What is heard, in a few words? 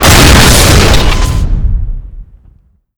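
explosion metal tank turret; Explosions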